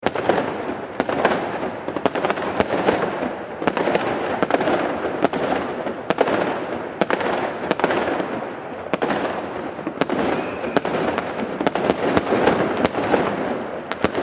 firework long

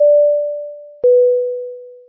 cabin chime

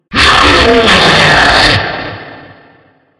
jumpscare sound; fraf 2

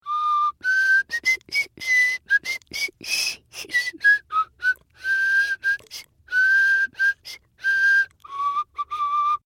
whistle

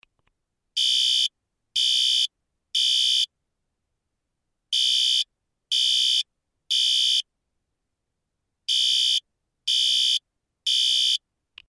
electromechanical; temporal

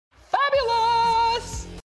follow alert

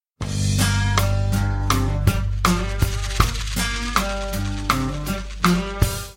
funk blues follow

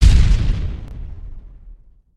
explosion sound effect

